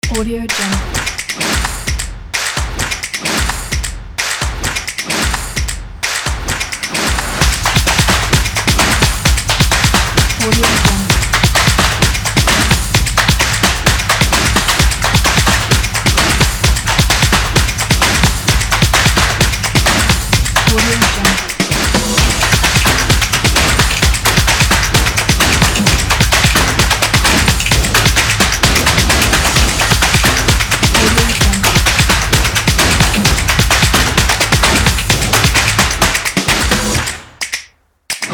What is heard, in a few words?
fast intro